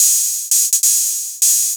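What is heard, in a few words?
TR-808 open hi-hat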